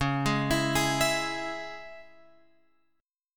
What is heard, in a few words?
Db Minor, Guitar Open F; Dbm arpeggiated; x,8,8,11,11,11; JGuitar